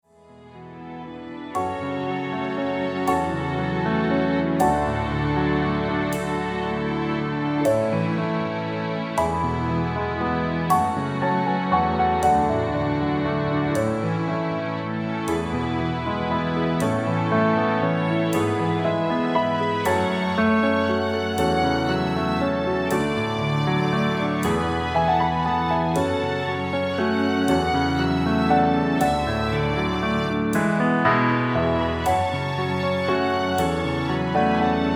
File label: rhythm